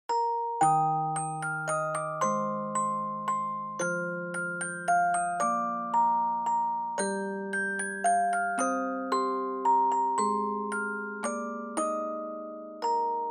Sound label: Dry Simple Fast; We Wish You A Merry Christmas